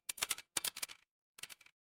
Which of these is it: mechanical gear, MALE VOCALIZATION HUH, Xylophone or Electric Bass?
mechanical gear